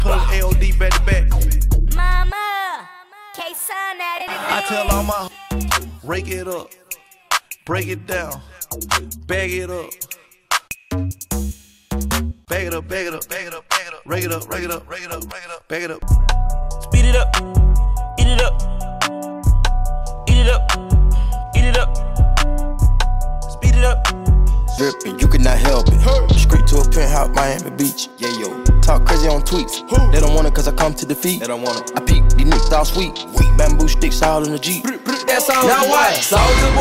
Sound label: cheer tryout